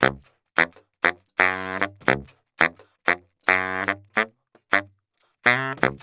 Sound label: baritone; Mäusejagd Interactive Demo; SOLOS